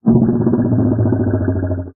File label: Stun Giant